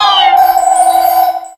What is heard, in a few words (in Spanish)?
Grito; Cresselia